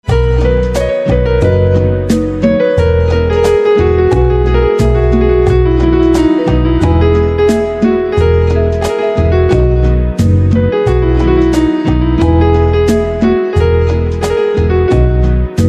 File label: Piano